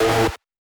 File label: distorto bits